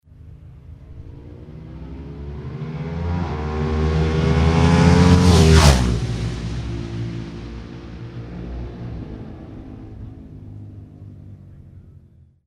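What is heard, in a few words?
Ducati 998 single; moto; Ducati 998 - passage 3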